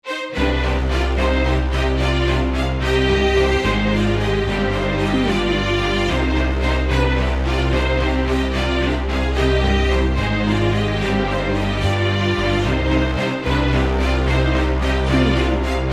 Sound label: majestic adventures no drums